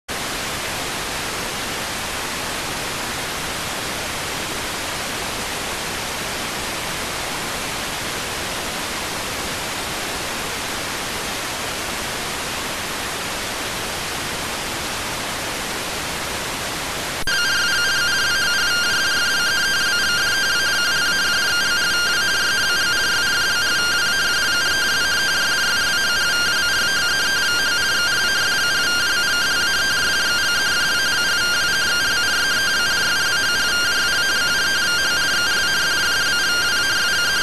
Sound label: rtty rx